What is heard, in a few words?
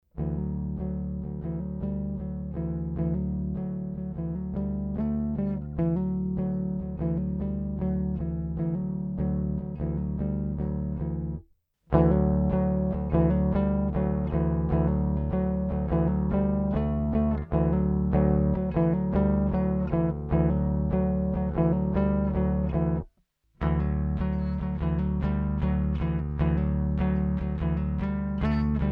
Ampeg SCR DI Sounds